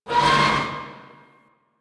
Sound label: Destruction Resonant Metal; Ambience; Warframe; Corpus Railjack